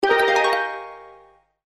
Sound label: New Chat Message; Blue; Sony Ericsson; P900